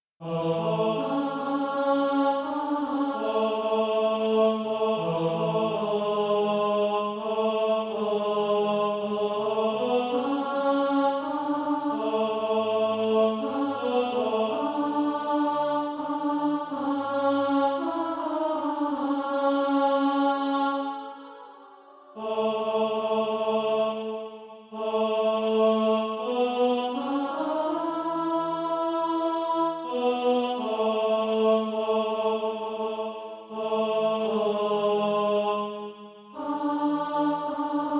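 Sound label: tenor, Practise Music; SATB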